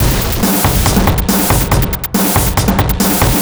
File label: Noise Beat; Drums & Drones